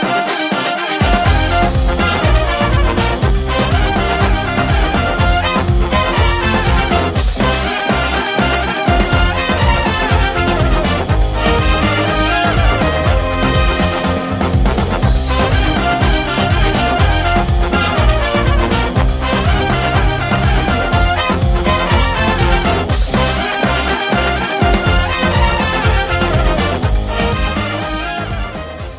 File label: instrumental